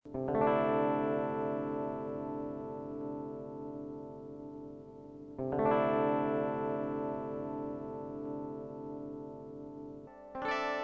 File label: chord fast